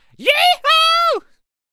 Lasso Attack Vocals

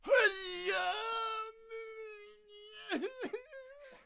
Death Vocals

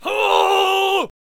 Attack Vocals; Squire

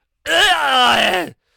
Peasant Death Vocals

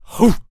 Protector Attack Vocals

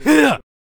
Stoner Attack Vocals

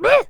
Attack Vocals; Painter

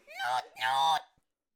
Fan Bearer Attack Vocals

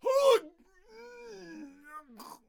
Death Vocals; Jarl

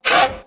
Raptor Attack Vocals

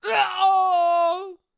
Death Vocals; Devout Gauntlet

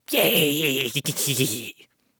Ice Mage Attack Vocals

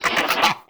Raptor Attack Vocals

